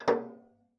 Ping, Kitchen Toaster

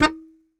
Very Short Note, Melodica

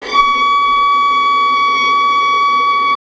Violin Extended Technique

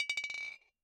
Bottle Struck

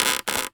Polystyrene Creak

